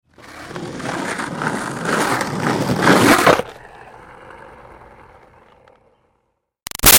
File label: Skateboard Up Curb Hit Crash; Roll Up; Skateboarding; Sound Effects:Sports; Big Room Sound